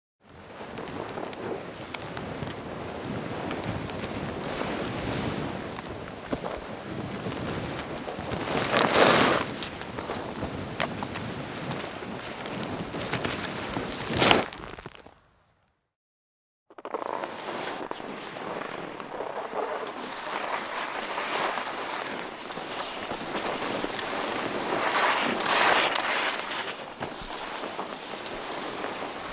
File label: Downhill skiing; snow